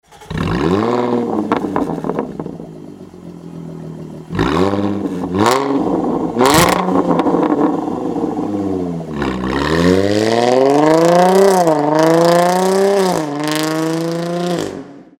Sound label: Jaguar F-Type S open valves; REMUS